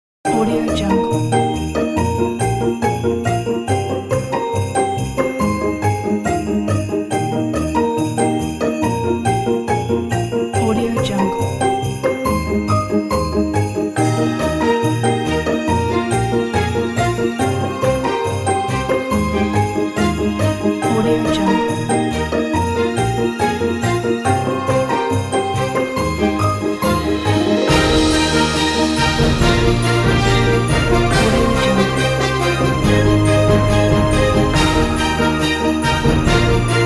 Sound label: christmas epic